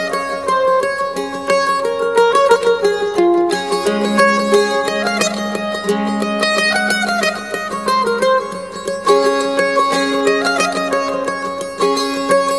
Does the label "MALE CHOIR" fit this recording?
No